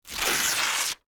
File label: paper rip; Books and Paper